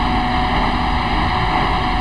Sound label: Run, Train; OpenBVE